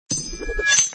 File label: attack